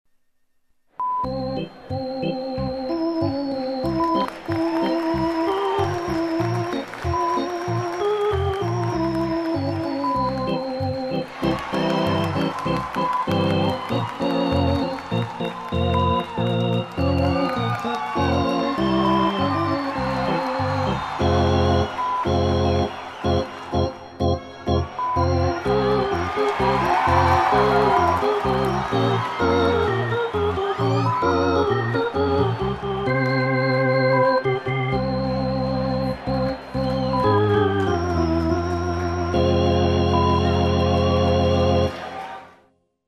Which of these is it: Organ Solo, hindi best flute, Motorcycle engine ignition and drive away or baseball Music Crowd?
baseball Music Crowd